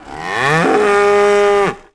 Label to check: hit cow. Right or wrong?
Right